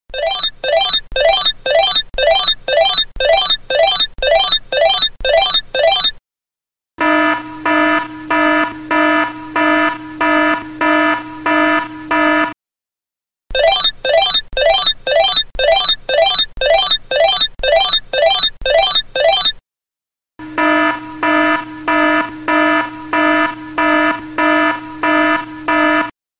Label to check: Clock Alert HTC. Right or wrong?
Right